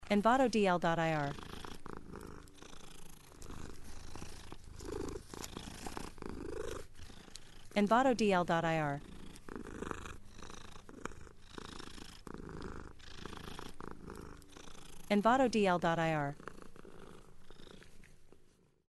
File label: Cat Purring Licking; لیس زدن گربه; nature sounds